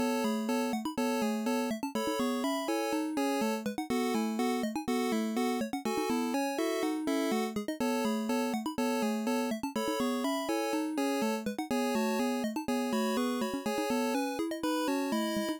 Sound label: Cute Ringtone